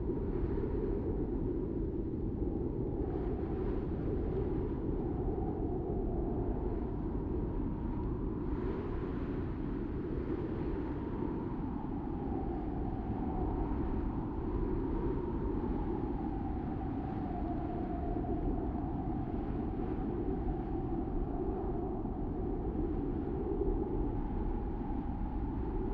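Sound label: ambience dessert mountain hotspring; chicory a colorful tale; macos ps4 ps5 switch windows gamerip 2021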